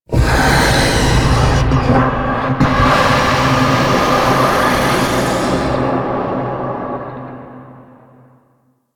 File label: Breathy Vocal; Ambience; Man In The Wall